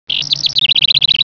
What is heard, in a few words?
house wren gurgle